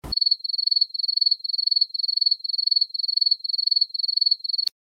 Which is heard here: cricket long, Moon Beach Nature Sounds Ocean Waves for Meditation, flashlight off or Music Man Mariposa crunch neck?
cricket long